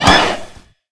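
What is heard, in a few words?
forest griffin wound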